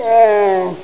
Doom 2 Explosion

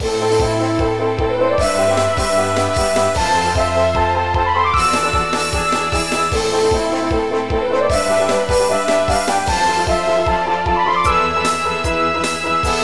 battle

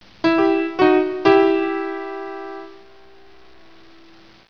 piano

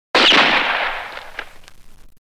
Gunshot

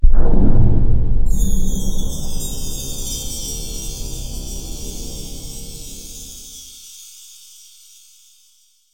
connect

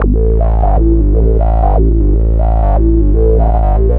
bass; GrooveMaker demo; Serious Software; Macworld 1999-04

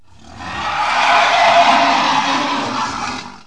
creature roar, space; KABOOM! - Wild and Wacky Sound Software

